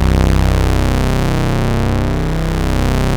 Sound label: OB C2 -L, Rare Synthesizer; Hollywood Edge - Giorgio Moroder Rare Synthesizer Collection; OB 4 VOX.